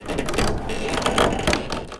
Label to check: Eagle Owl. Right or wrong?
Wrong